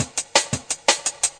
170 narrow snares, drum loops and breaks; 161 to 180 bpm

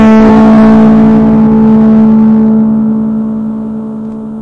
one distorted note, Guitars

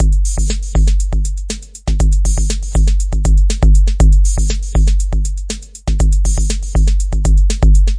120 bpm drum machine; drum loops and breaks

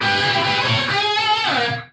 straight up guitar lick

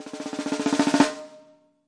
real snare roll; REAL LIVE KITS; A Real Freakin Drum Kit

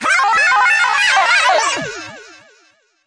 tekno babble; Various Strange Sounds and Effects

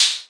hh, Maestro Rhythm King; Maestro Rhythm King 1966; drum machines

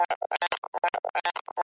squelchy synth, synth and electronic loops

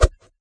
kung fu staff, swings; UNUSUAL KITS and FX

